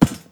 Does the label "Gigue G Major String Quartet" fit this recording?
No